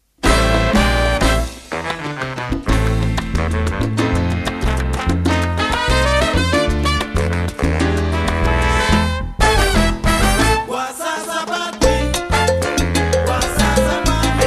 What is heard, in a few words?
Salsa